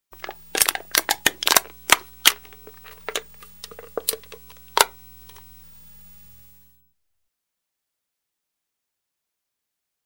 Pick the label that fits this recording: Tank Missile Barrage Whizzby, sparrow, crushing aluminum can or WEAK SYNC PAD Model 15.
crushing aluminum can